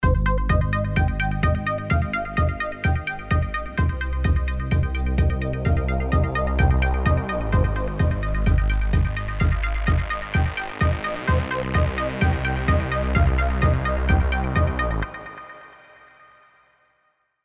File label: BACKGROUND EMOTIONAL